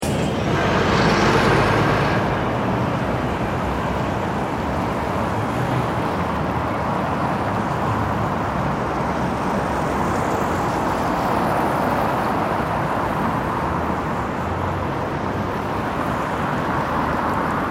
Traffic Heavy Car Line, Cars and semi trailer trucks pass by and stop; Traffic queue; Some high squeals from breaks, rush hour